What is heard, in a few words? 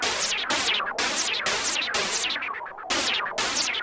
Juno-60 Arp 125BPM